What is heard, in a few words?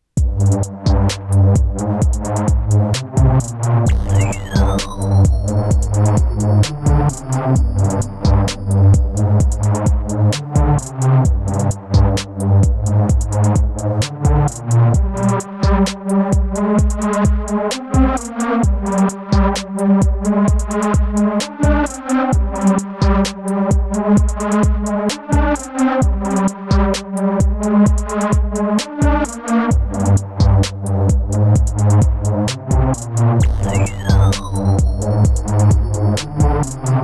low leads